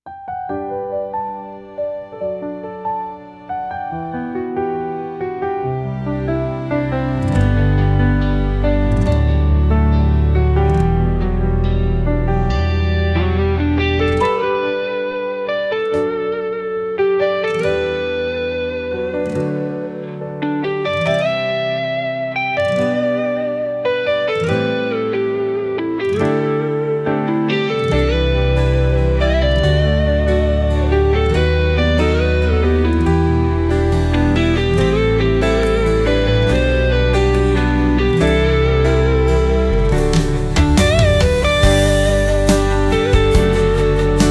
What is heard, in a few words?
Piano, Guitar Melody and Drums, instrumental; ai social media music